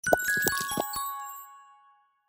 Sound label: symbol win drop; Fondant Favourites StarTrail; Eyecon